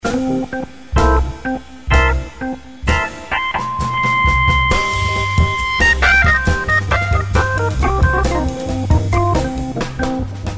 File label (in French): effet wahwah, orgues Hammond